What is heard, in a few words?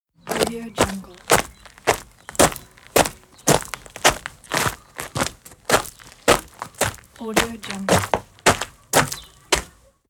walking on gravel; ducentije